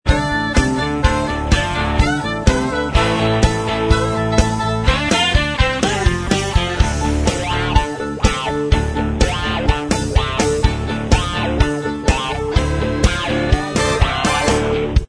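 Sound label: instrumental, backing track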